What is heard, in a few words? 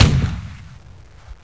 cannon; duke nukem total meltdown